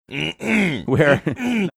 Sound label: clearing his throat